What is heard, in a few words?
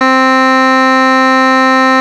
piano, SQ80